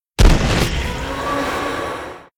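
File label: butterfly spawn, monster; Senillinea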